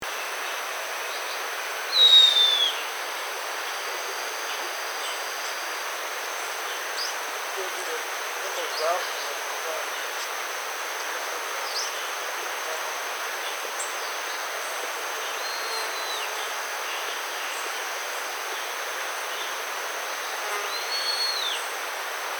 milan bleuatre

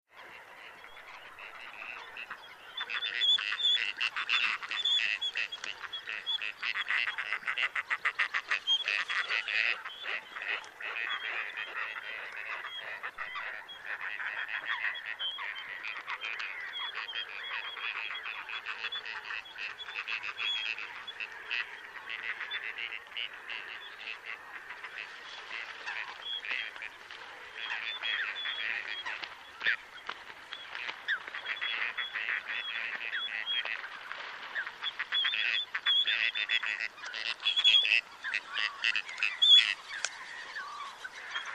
canard chipeau, chant

